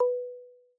Level Decrease, iOS Sounds

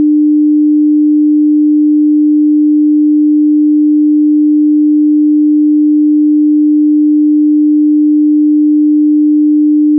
300Hz Stimulus 4.5 dBFS Mono; 16bits; 10sec; 48kHz; iOS 26 Sounds

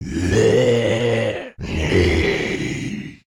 monster noise; Original TK Sounds

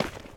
dirt step; Golden Crayon Sounds

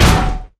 wall metal; Golden Crayon Sounds

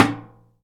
wall tin; Golden Crayon Sounds

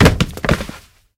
bridge fall; Golden Crayon Sounds

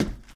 tile step; Golden Crayon Sounds

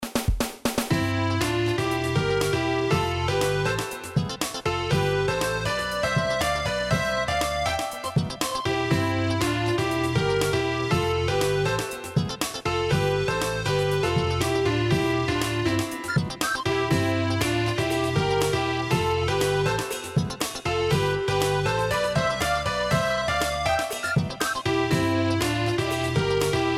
city; Manamon Music; Manamon 2; vermechaw

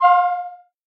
object exit; ChromeVox Classic Sounds

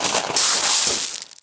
gravel fall; Golden Crayon Sounds

